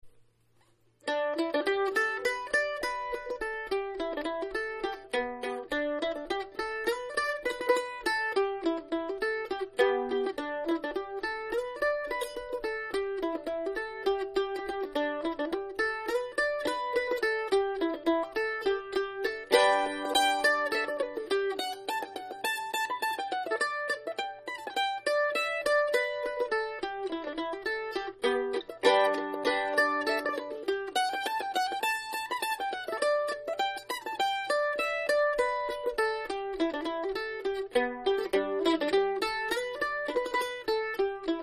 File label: greasy string, bush banjo